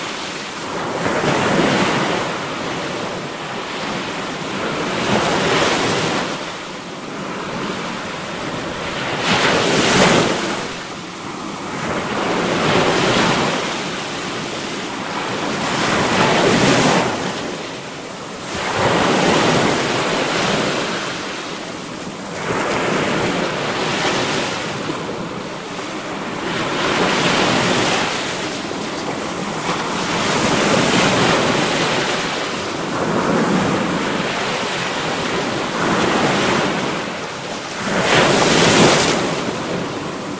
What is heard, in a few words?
waves sea; legend of myst jump